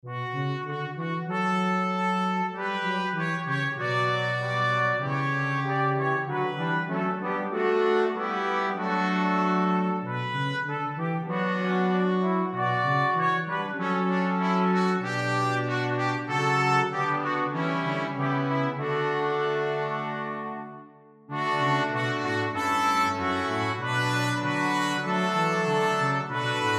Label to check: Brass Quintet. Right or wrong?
Right